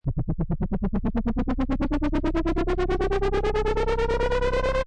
Neutron Machine, Pulsating sound of a machine, with the frequency getting higher; approaching to an explosion, or a flying saucer; Lynne Music